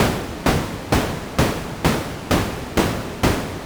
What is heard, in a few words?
Modular 130BPM; noise hiss crackle